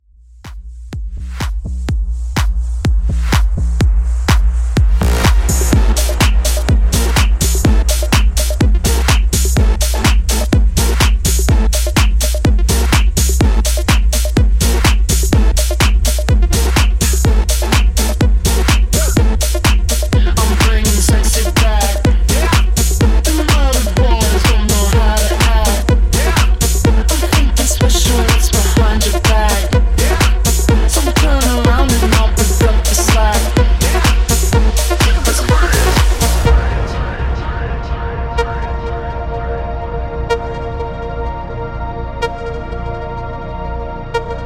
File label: Epic Mashup 125 Bpm